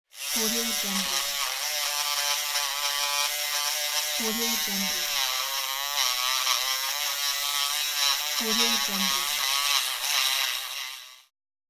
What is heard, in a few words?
electric nail drill whirring sound; domestic sounds